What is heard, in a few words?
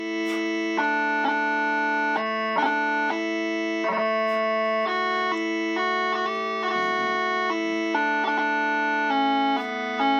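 O Come All Ye Faithful, bagpipe tunes; the holidays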